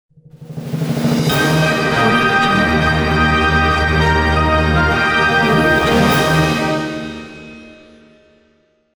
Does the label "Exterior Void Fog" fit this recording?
No